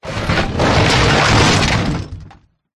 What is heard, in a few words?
impacts roll over smash metal glass plastic